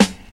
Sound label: modern smooth hip hop snare drum g key